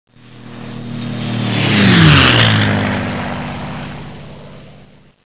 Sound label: AIRPLANE